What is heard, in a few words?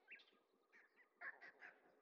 monkeys; dota